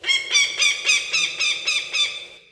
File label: eagle; Mafie 1